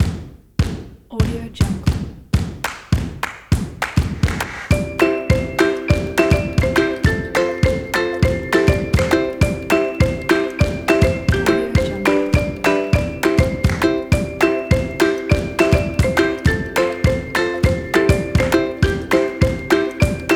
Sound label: funny kids slideshow